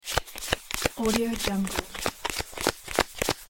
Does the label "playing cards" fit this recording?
Yes